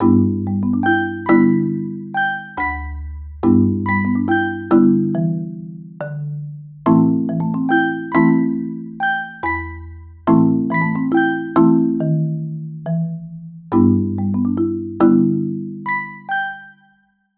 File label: Tone